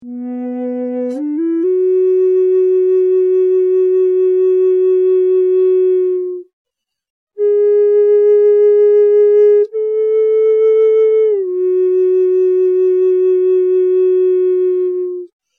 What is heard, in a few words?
Bass B Eastern Cedar, flutes